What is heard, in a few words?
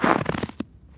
Step Snow